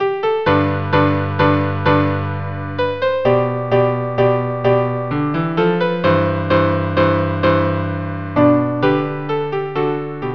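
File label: violin cello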